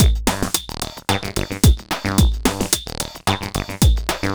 303 (w beat); Sonic Foundry (Sony Creative Software); Ugly Remnants VOL-2

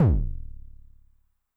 Bass Drum Pitch Depth Overdrive, 909; classic machines; 909mod

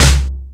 FX Sounds, Drum Machines E-MU Pro-Cussion

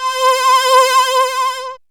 syn c, Dance Synths, Juno; Juno Jam

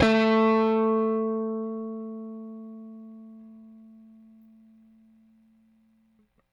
Rickenbacker Bass; Airwindows

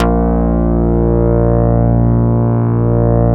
A#1 ANA BS, synth bass

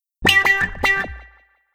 Wah Wah Gtr; Guitar

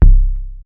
Bass Drum, Cwejman S1 MK2

